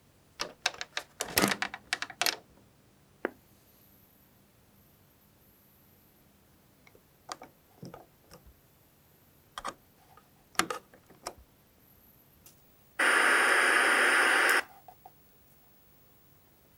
CRT Turn On; SFX; IRL Recorded

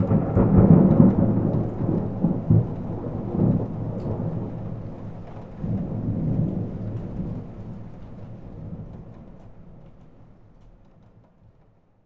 Thunder

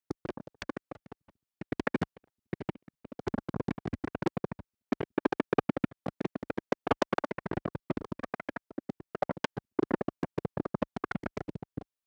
Popping, Experimental; SFX; Experimental - Other

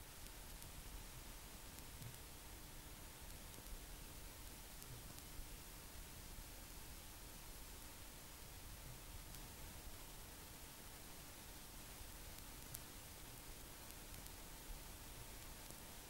VHS Noise; SFX; IRL Recorded

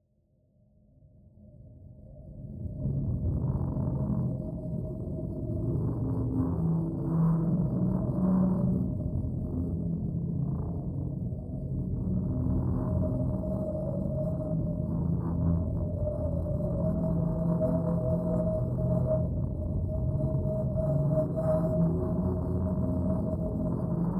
Water Organ; SFX; Ambient - General

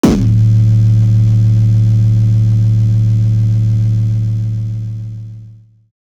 Lower Tonal, Bass; 808

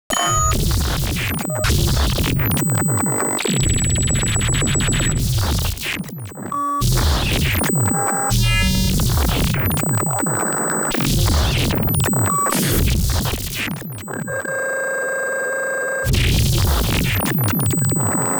OTT Artifacts Combo, Electronic

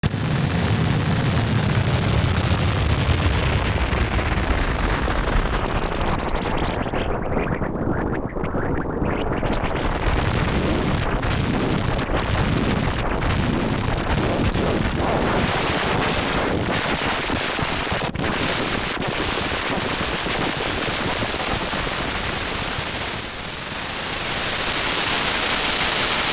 Reverb Tail Modulation; SFX; Experimental - Other

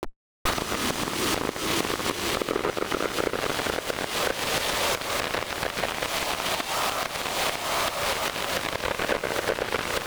Digital Rain; SFX

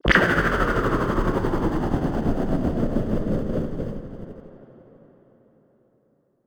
Downlifter; SFX; Downlifters

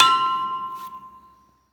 Metal Pipe; SFX; IRL Recorded

